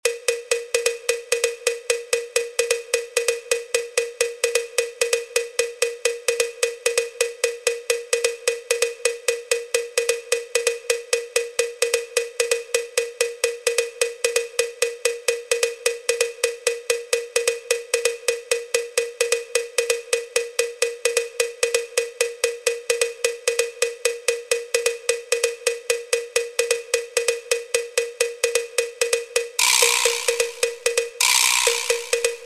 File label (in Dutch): Percussion